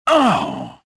pain; reborn

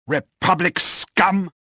anger

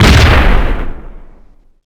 Explosion Grenade; Max Payne

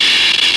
welding short; electrical sparks

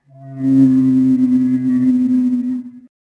Call, Dino

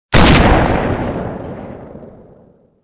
gunshot slow; Soldier Of Fortune II Double Helix; XBOX

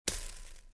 rain fizz; weapons

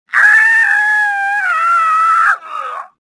falling, worker; worker1

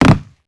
jump Land Wood; characters

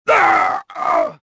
death

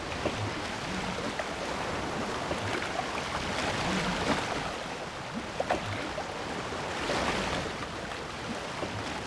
shipwright amb bed; Sid Meiers Pirates; XBOX

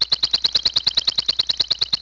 seagull; Project Gotham Racing

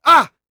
get hit voice; UFC Tapout; XBOX

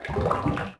water bubble; Tony Hawk's Project 8; XBOX

